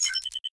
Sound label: Unlock, ui